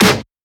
Snare; FLPRO

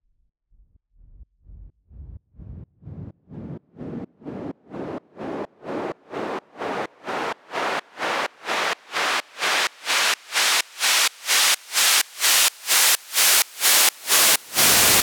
Raise SideChained

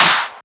Clap; FLPRO

